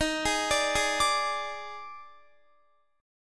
Eb Major 7th Suspended 4th, Guitar; EbM7sus4 arpeggiated

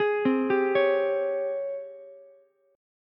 G sharp Major 11th G sharp, Ukulele C6; 13,12,15,16; JGuitar

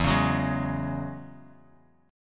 E 6th Add 9th E down Guitar DADGAD

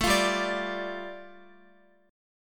G 7th Suspended 2nd, Guitar; G7sus2 strummed

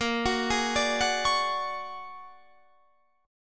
Bb 7th Sharp 5th Sharp 9th Bb; Bb7#5 #9

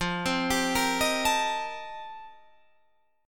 F 11th F, down, Guitar Drop D

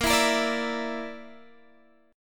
Bb Major Flat 5th, Guitar, Standard; x,13,14,15,15,12; strummed

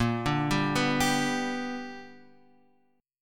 B Minor Major 7th Sharp 5th, chord

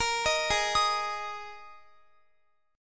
G sharp Suspended 2nd Guitar chord, down; arpeggiated; 4E,4A,5D,5G,5C,6F; G#sus2

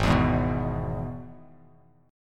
Gsharp Minor Sharp 5th, Bass Standard; strummed; 4,7,6,4

